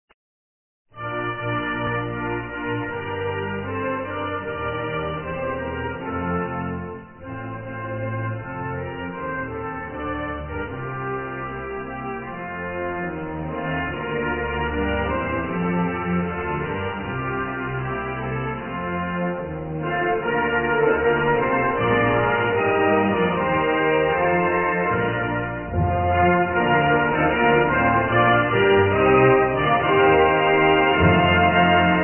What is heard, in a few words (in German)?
hymne schweden; Europa